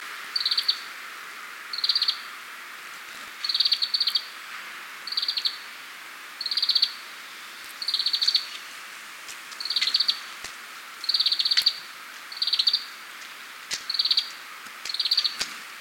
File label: Chloris sinica call; passeriformes; fringillidae; birds of the ussr